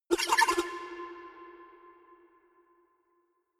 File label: notification hectic, signale; kommunikation